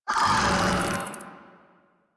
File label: Desert Skate Vocal Death D; Warframe